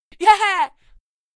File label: fight end; Becca